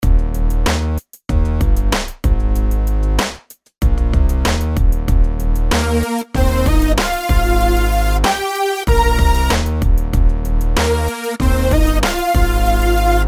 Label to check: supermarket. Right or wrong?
Wrong